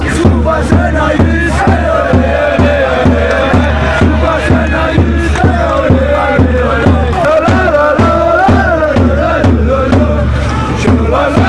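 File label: lolololololo, fanchants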